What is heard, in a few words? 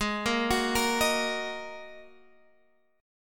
G sharp 7th Suspended 2nd, Guitar, Open G; down; chord shape